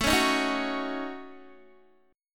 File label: A 6th Flat 5th, Guitar; A6b5 strummed